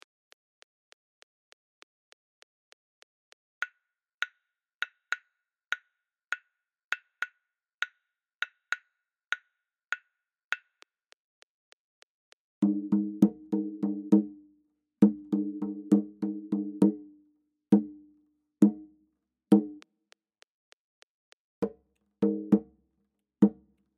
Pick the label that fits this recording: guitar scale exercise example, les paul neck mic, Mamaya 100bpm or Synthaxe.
Mamaya 100bpm